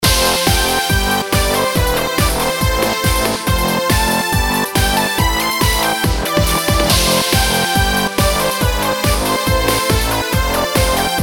シンセ 音作り サンプル ベース まで まとめ

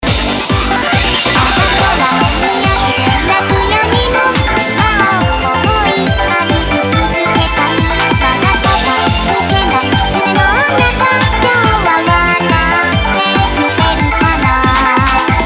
コード から メロディ 例 サビ

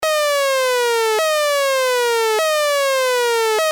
LFO ノコギリ波

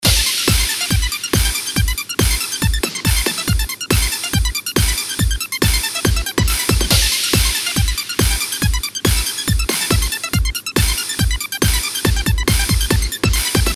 シンセ音作りサンプル アルペジオ

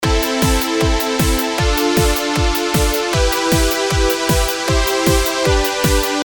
パッド ノイズ 有り

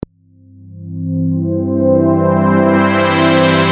パッド フィルター 操作

